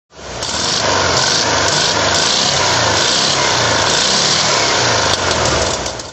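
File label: porsche 356 speedster 1500s throttle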